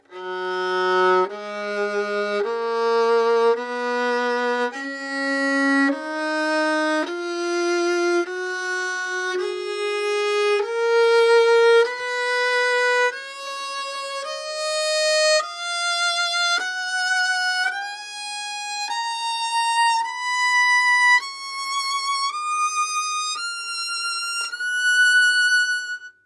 Scale

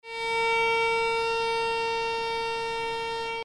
A sharp 4 reverb no vib

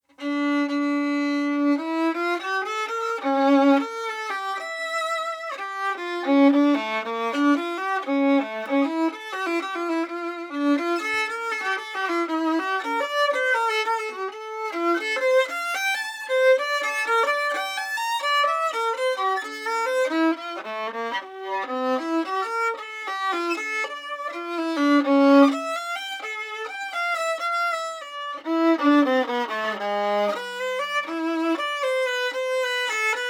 Modern Bow Gut; C.R. Bach

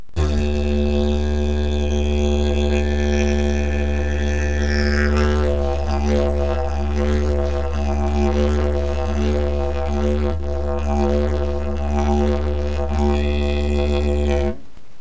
Circular breaths

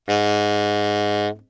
A sharp 3, saxophone tenor

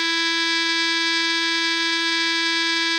G4 normal, Clarinet

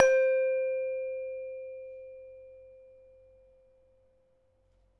equilateral plate second partial, bell plates

